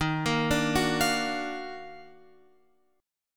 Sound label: Eb Minor Major 9th Guitar Open F; JGuitar